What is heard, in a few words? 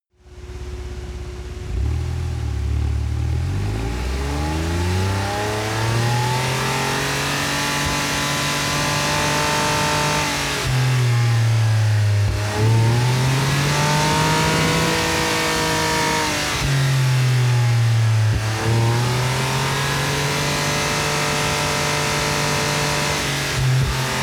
sports car ext engine very high rpm unstable